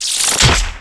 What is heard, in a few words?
astral strike